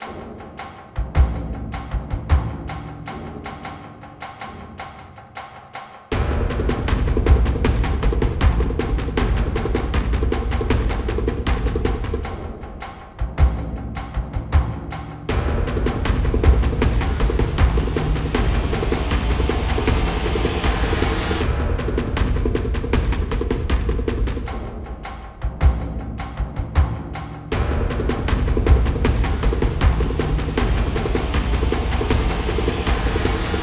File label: 157bpm LOOP; LogLog Games; Epic Combat Music Pack; Time For a Revenge